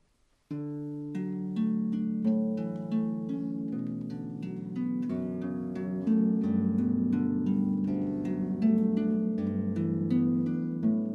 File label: harp